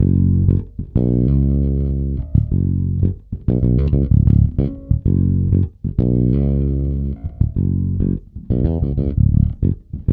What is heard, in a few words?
F#, funk, bass; Spectrasonics; Bass Legends